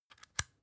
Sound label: spice jar close soft